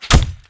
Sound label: hit wall, knife; csgoknife